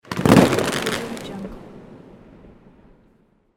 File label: firework single